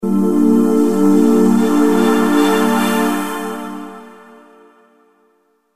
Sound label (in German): plastic schwebe ton